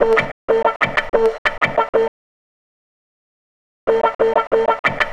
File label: Wah Guitar; Sonic Foundry; Sony Creative Software; Stylus Pressure Urban Grooves on Digital Wax; What Up